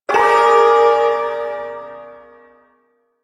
Ersatz Shawzin Min Chord; Warframe; Duviri Ersatz Shawzin

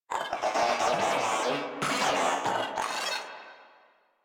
Moa Vocal Idle, Enemies; Warframe

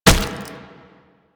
Step; Warframe; Proto Infested Brachiolyst

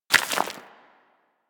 Bullet Impact Debris Rock; Warframe; Weapons

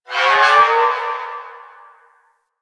Exterior Distant Space Calls, Ambience; Warframe; Railjack

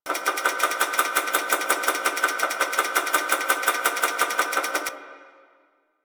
Moon Clock Machine Small Loop; Warframe; Ambience; Orokin

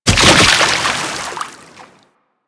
Kubrow Land Water, Footsteps; Enemies; Warframe

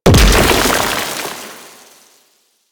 limb death dissolve; Warframe; Man In The Wall

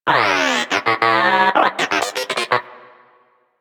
Moa Inquisitive Vocal Agree; Warframe

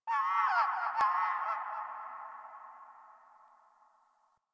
Birds High, Ambience, Plains; One Offs; Warframe; Eidolon Plains; Eidolon Landscape

